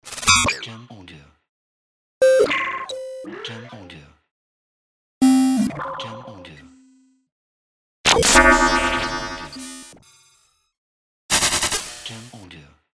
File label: Glitch Collection